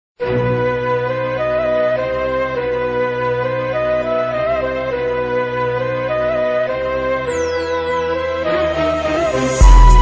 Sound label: Sad Music